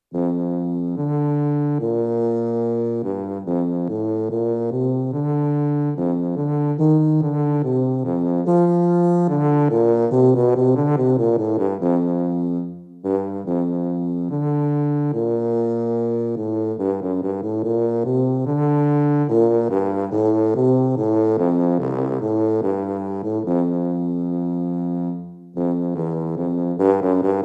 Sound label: concert tuba lyrical etude